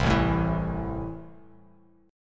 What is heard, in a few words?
A Minor, Bass Standard; Am strummed; JGuitar